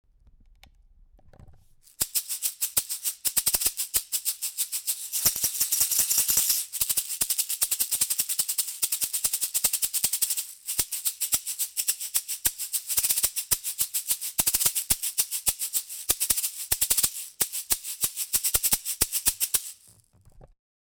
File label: Shakerz